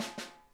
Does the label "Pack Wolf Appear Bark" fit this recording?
No